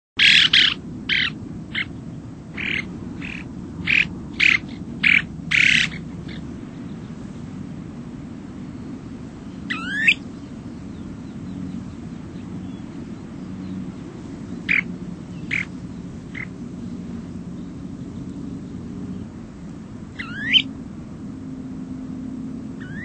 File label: Snowy Plover; Birds